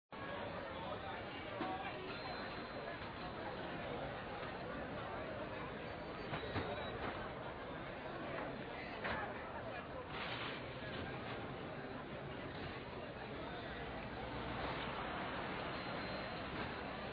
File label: ambience dungeon